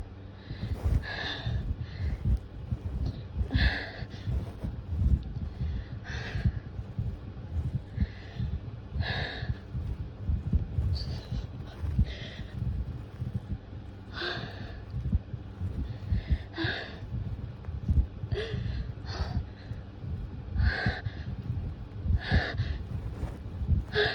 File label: pillow riding, moans; F4A; My first audio! Be nice please..